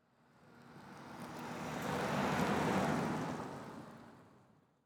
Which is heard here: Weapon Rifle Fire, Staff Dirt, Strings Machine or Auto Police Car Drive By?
Auto Police Car Drive By